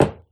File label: Step Metal Refined